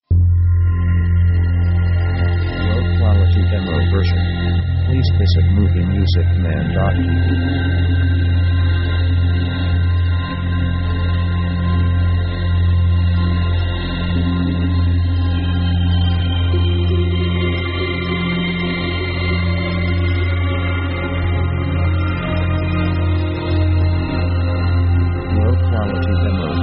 synth suspense and action; synth themes

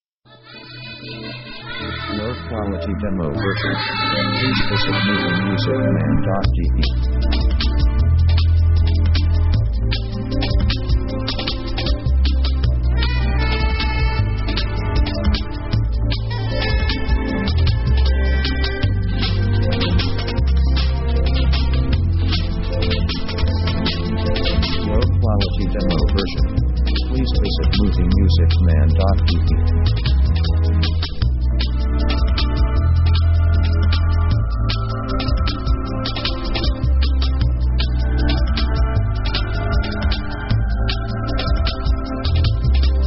jungle orchestra

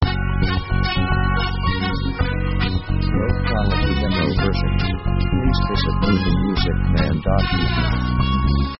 pop friendly caribbean

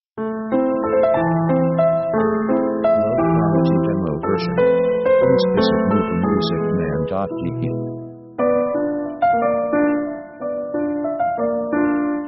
piano walz sketch; orchestra themes

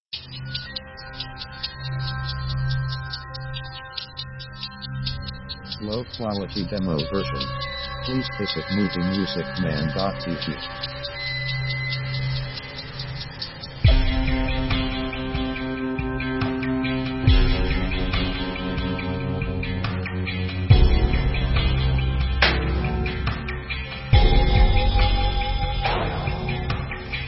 electro

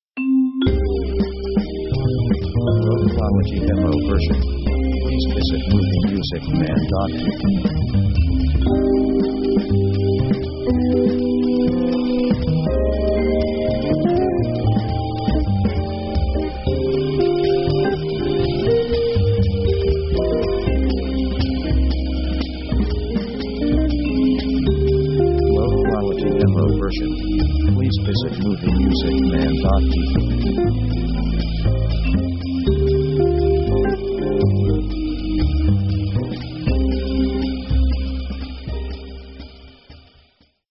jazz lounge

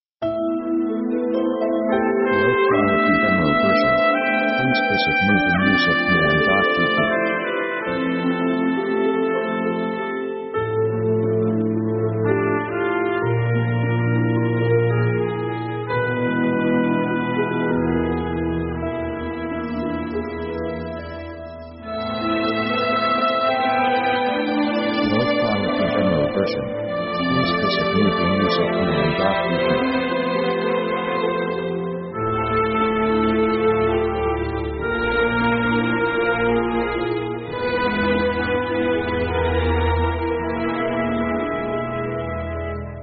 orc progressing adventure; orchestra themes

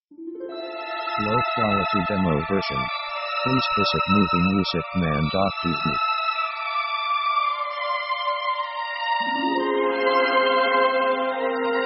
Passionate Love Theme

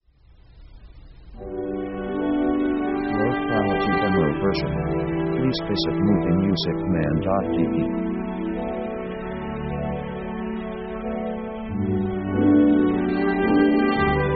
sudden change

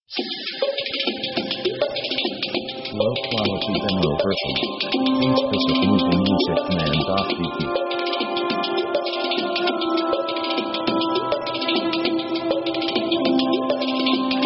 pop all exists ever, contemplative; contemplative themes

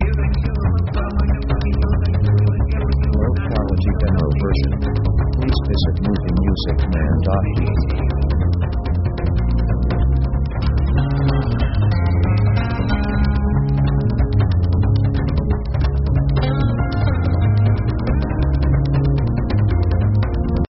ethnic african; synth themes

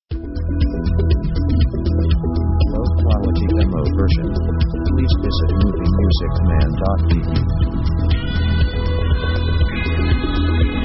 Silent Night Electro

